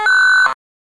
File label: air turret active loop; hl2; vehicles